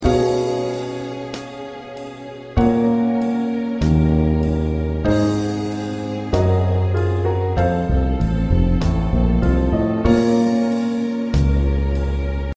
Accompaniment

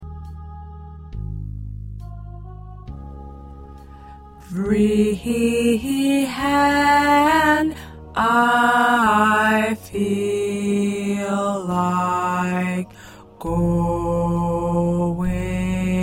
Tenor; SATB

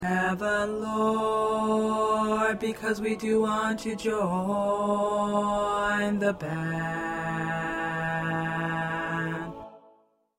Bass 1, choir parts; TTBB

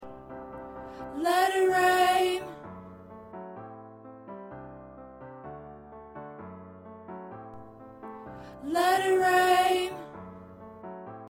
Tenor

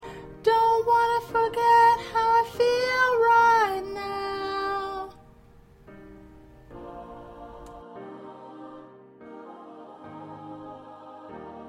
High Lead, choir

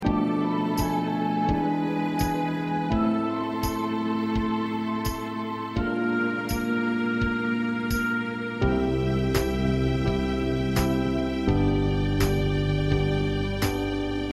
Accompaniment

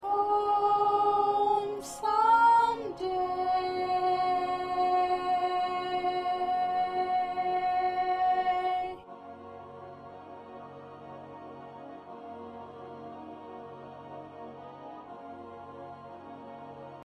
Soprano 1

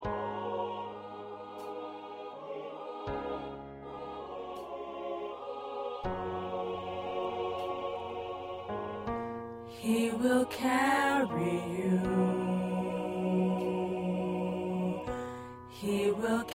Choir Tenor

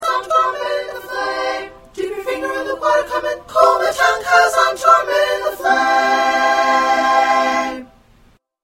SSA; combined parts

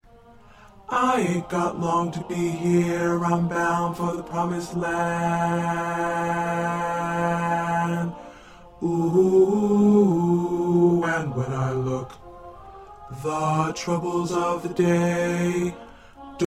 Bass

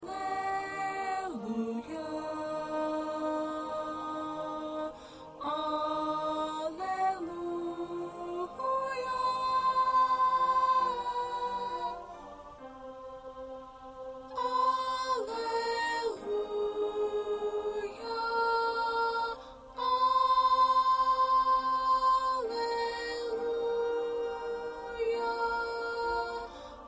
2nd Alto

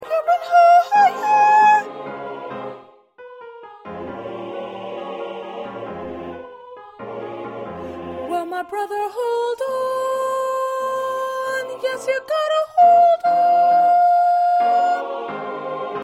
Small Group Soprano

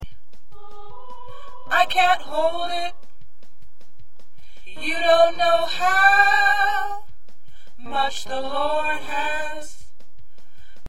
Tenor, choir

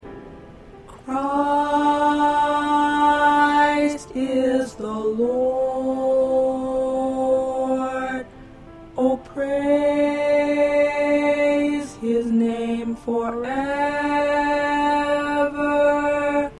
Tenor

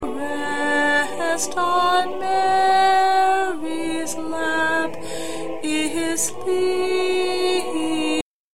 Lead; What Child Is This

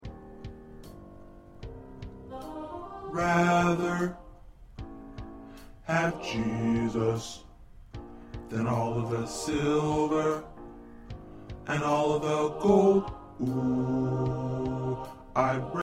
Bass

